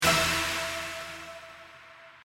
Fast Line; Holy Goat; winline

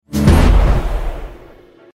win Big Mega short

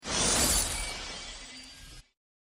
mystery Trigger; Highway B

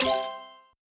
wild second, slots; Dolph Dive

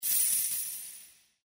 win ending; Holy Goat

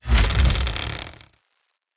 chest open empty

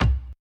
stop

